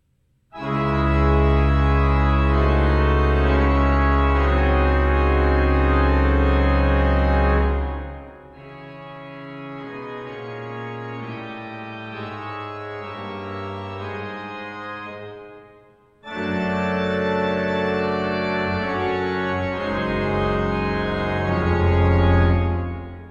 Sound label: Organ, Fugue In C Sharp Minor; Wesley SS; 1869; McVinnie